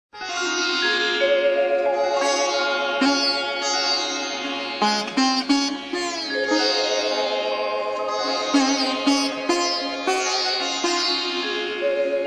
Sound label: Dhun On Sitar